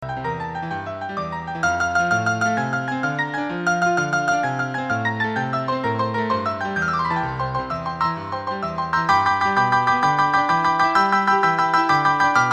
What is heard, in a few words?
Boogie-woogie para piano